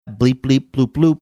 bleep bleep bloop bloop

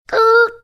making tire squealing sound

